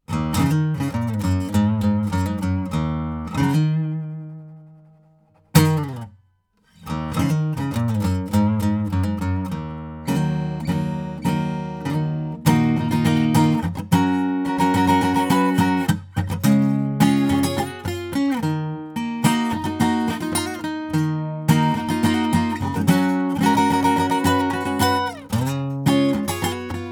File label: acgit sound samples tube warm; lct1040